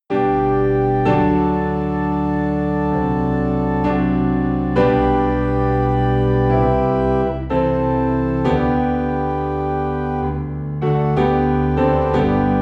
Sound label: Piano and Organ Low in C; christmas; O Holy Night